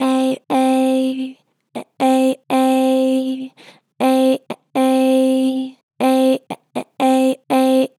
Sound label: Eh Eh, Vocals, Pitch Flat Gentle; Harmor Vocals